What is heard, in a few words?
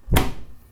closing oven door